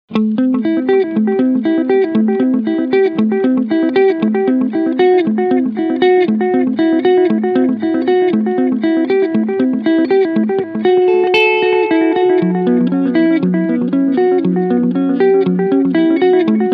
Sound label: Echo Dream 2; Death by Audio